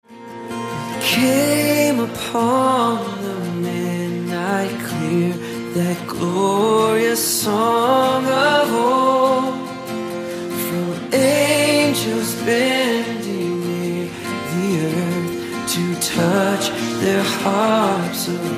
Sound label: Love Song